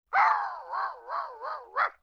LoFi Synthetic Dog Squawk, Vintage Cartoon Comedy Vocals